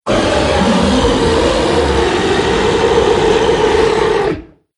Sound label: Nightmare Beast Roar; Monster Sound Effects